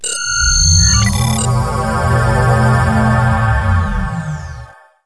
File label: beam energize; trek